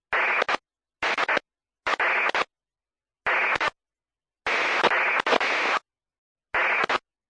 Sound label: signalius on kv